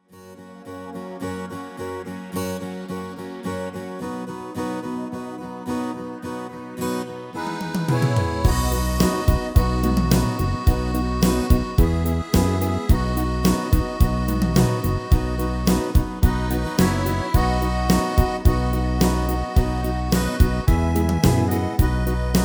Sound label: MIDI